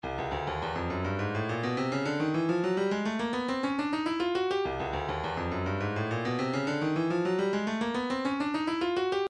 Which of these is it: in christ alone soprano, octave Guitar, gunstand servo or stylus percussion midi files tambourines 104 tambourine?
stylus percussion midi files tambourines 104 tambourine